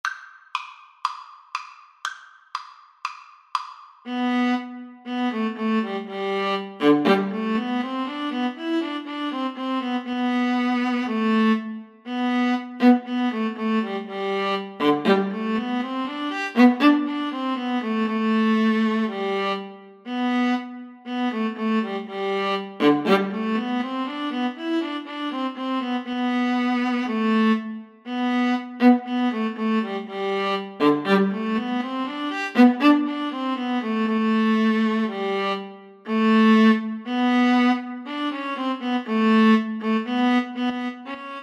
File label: mozart, Viola Cello Duet; k487 no5